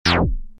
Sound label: Bass Pluck